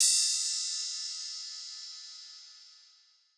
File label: Ride